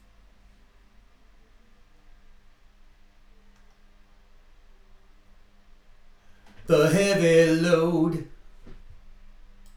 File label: vocals